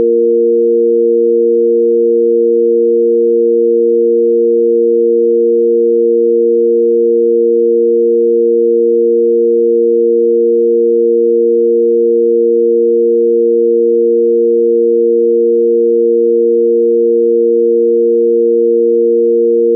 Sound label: Bb Reference Tone